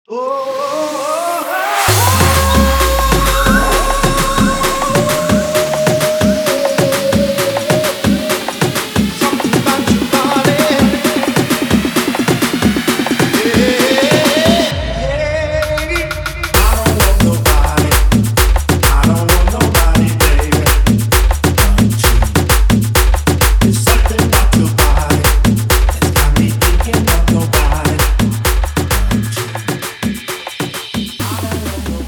intro outro 131 bpm

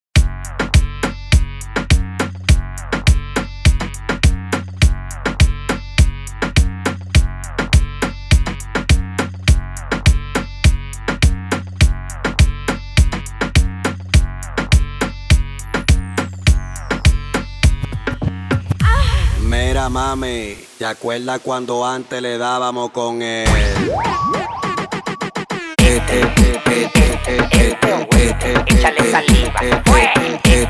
Intro Outro 103 BPM

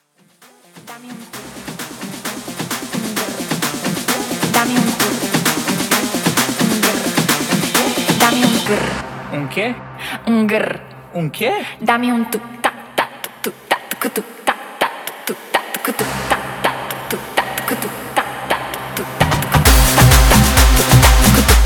tribal remix 130 bpm

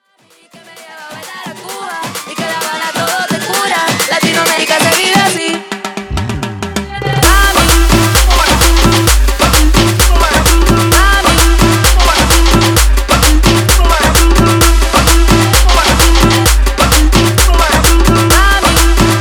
guaracha remix 130 bpm

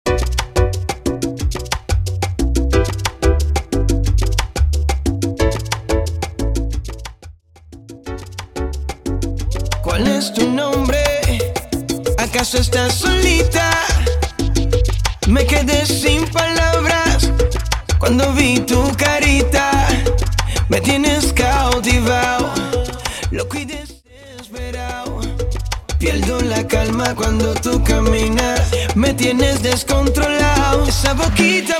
Salsa To Reggaeton 90 BPM; transition salsa to reggaeton

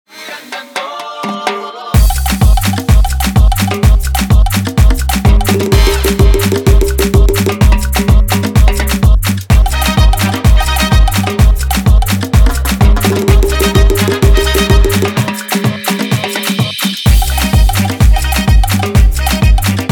afro remix 127 bpm

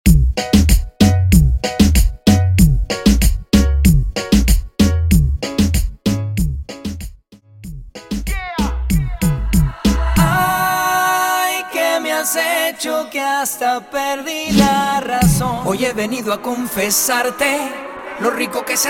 percapella intro outro 95 BPM

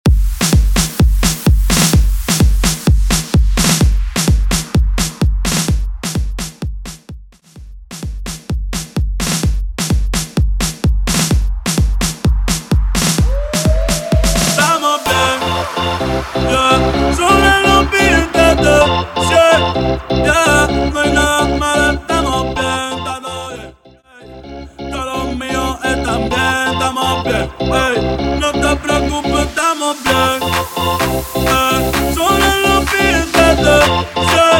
House Remix 128 bpm

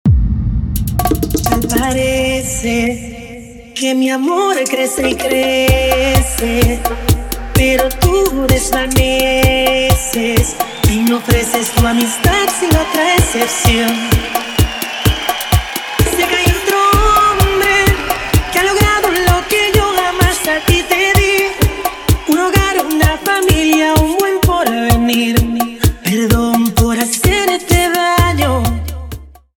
Acapella 128 BPM; Acapella Starter

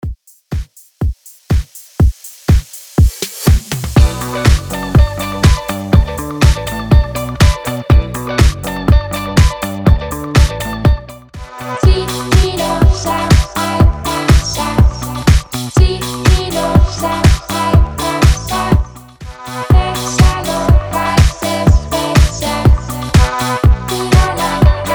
intro outro 122 bpm

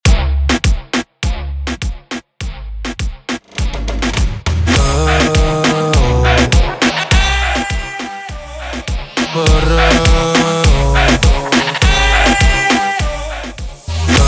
Intro Break Outro 102 BPM

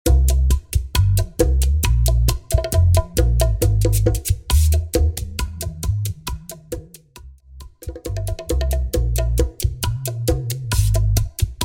percapella intro outro 135 BPM

